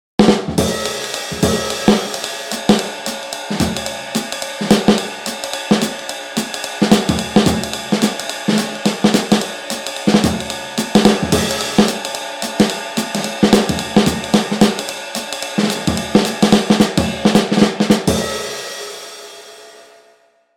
50s Hard Bop

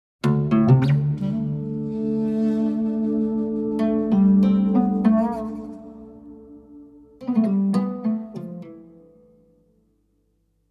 World Strings Oud; Desert Moon

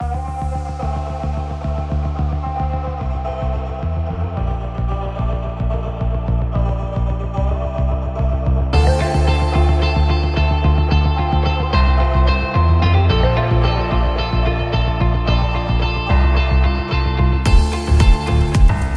EDM

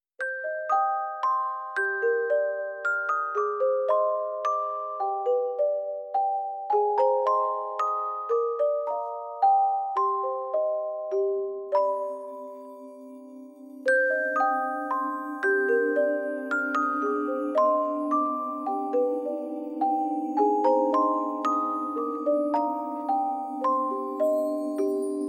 Celesta; Sonokinetic

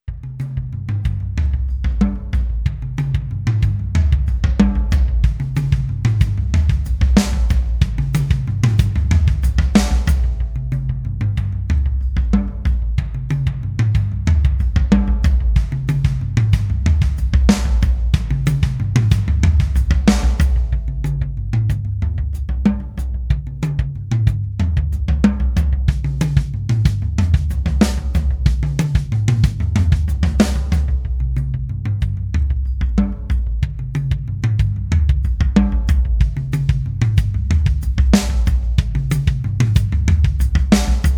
4 Toms Mixes; DRUMASONIC

